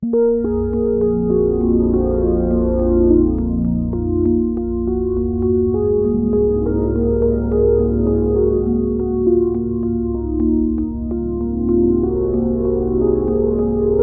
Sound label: Loop